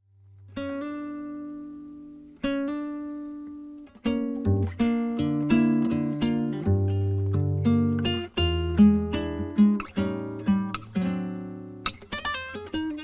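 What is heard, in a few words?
guitar jazz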